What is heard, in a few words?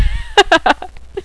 laugh